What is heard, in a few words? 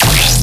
Bass Hit E, Dubstep; Shine Bass; Ghosthack; AC2024 - Day 13 - Dubstep Sounds 2025